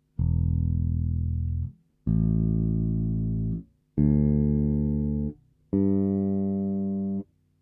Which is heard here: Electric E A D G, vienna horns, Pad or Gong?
Electric E A D G